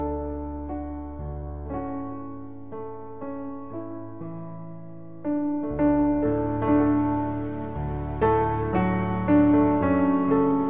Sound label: piano